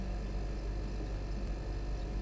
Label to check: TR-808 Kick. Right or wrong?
Wrong